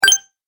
Super Bright Collect, video game

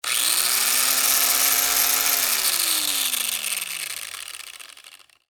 Industry Roto Saw On Run Off; Saws; Machines

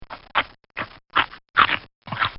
Cartoon Sound Effects Crunches; Cartoon Sound Design

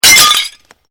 GLASS SHARD SMASH; Material components for constructing more complex sounds; Materials

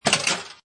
Pinball Sound Launch With Clanky Rattling; Video Game Sound Effects; Lynne Music

